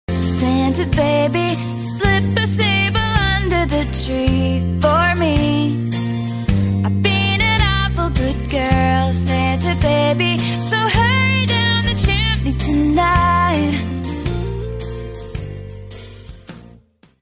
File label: Ringtone